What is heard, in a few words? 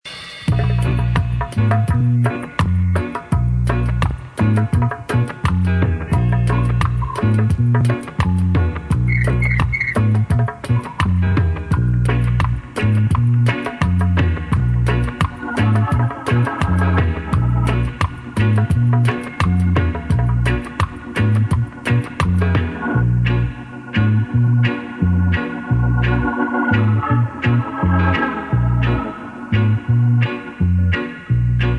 drum song dub